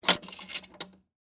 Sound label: Pay Phone Coin, Technology, Telephone, Coin, Return, Open, Remove, Coin, Pay, Phone